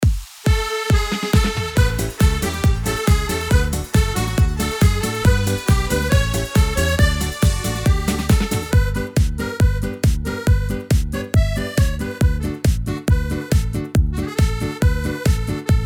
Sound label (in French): Marche portugaise; AiR Symphony